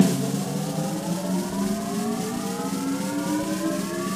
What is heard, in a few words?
effect reel